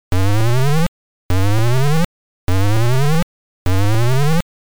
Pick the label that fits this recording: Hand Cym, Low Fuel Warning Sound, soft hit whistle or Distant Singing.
Low Fuel Warning Sound